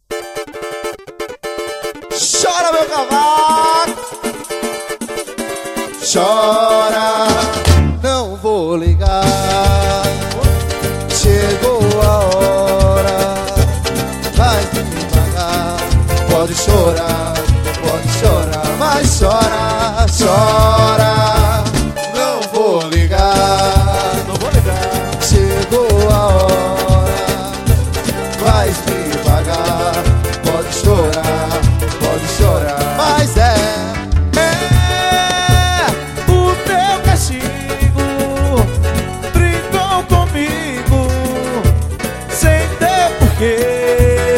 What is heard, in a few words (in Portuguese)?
toque de samba chora